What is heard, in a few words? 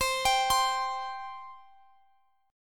C 5th, Guitar, Drop D